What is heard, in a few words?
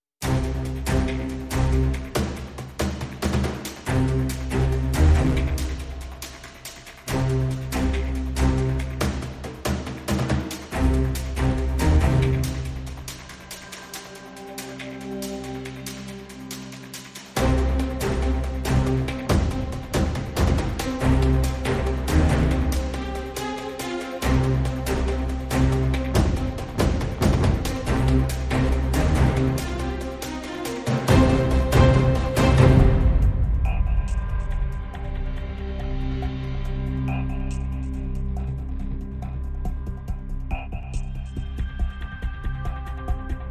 No Choir